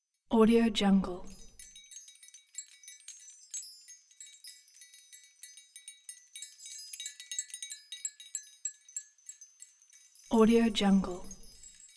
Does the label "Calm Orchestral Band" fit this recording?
No